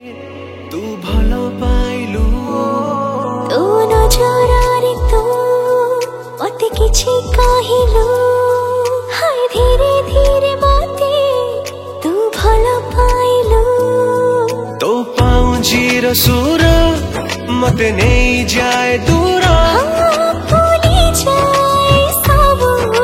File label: Odia Music Video